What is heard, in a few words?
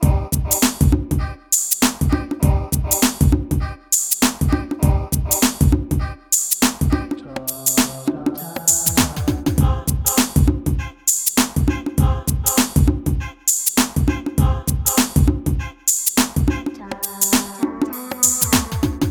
Mellow Conga Vocal Loop